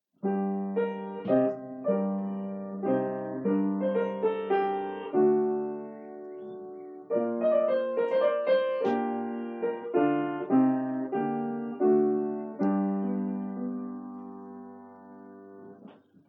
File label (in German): Begleitung g-Moll; Dowland